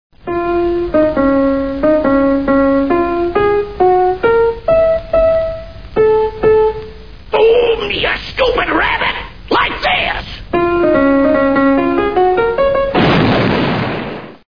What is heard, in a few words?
piano; looneytunes